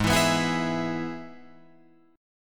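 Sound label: Ab Minor Sharp 5th chord, Guitar, Open F tuning; strummed; 8,11,11,11,11,11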